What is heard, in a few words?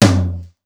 Simmons SDS2000; SDS2000 Factory3